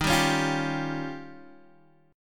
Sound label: D sharp 9th D sharp, Guitar Standard; D#9 strummed; JGuitar